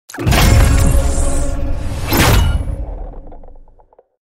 victory; warzone

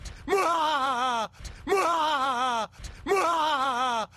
brain laugh

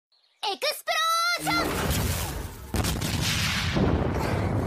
explosion; megumin

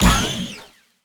blaster; Cad Bane

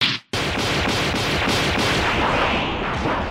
punch; dragon ball

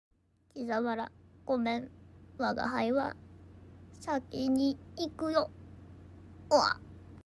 dying wah; laplus darknesss

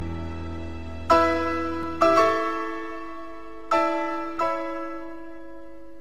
piano chord; halo 3

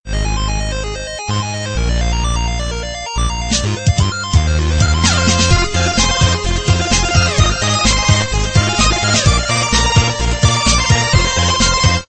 epic keygen

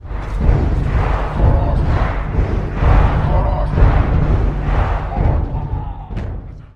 orcs march chanting

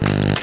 buzz

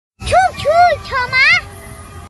choo choo

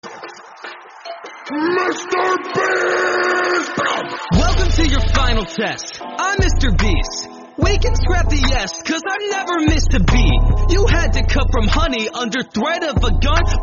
rap battle; mrbeast

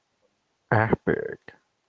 epic

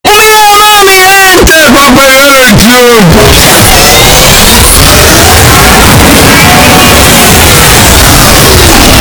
ahh sound; don pollo

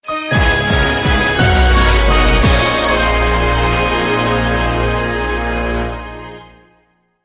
stage clear; shantae half genie hero ost

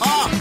aaugh

